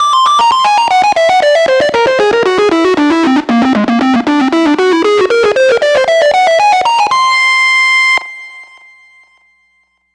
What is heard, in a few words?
Grunge Lead; Riffs & Solos; Dr. Fink's Funk Factory